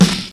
short steel snare drum sound f sharp key

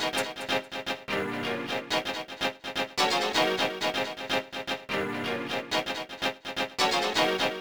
rave sequence funky lead house Fm 126; hardcore

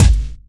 kick one shot G sharp key

